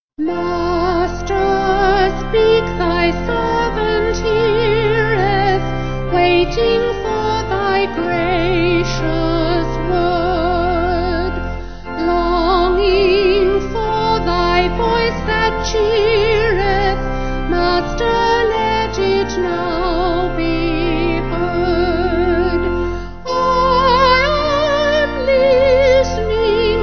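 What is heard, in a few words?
Vocal Organ, church music backing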